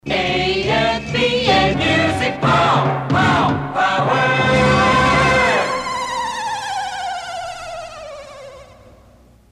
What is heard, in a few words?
Music Power Jingle; chairborneranger